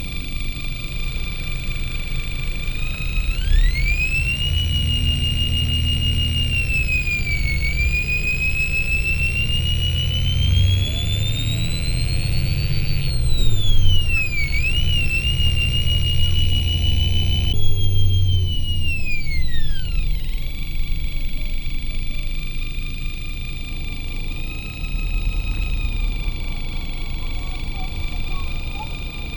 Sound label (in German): Flughafen Bus Transfer, Brasilien; Fieldrecordings